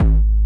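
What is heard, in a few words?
Bass Drum Jungle Long Loop; Yamaha DJX-2B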